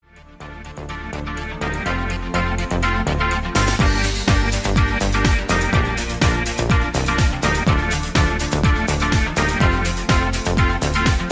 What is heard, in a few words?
Instrumental